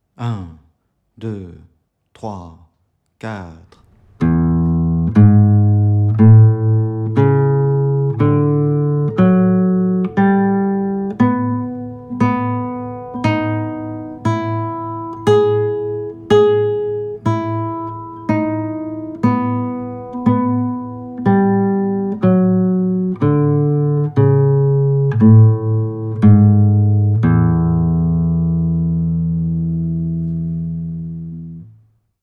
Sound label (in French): exercice 060bpm